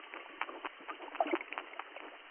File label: searobin, fish grunting or feeding